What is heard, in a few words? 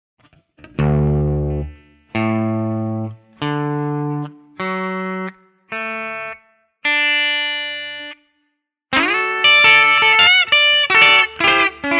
open d major